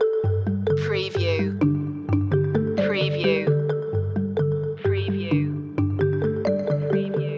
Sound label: marimba mood loop